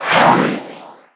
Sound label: roll; PC Gamer IT CD 48; trickstyle